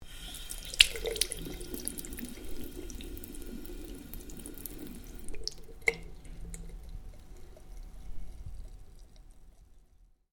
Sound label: Tap sink, Tap: Water Runs Into Sink Then Drains Away; Bathroom; Household; Stuart Duffield